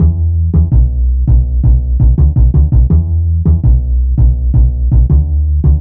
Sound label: A2 165bpm, Bass Loops; Total Drum&Bass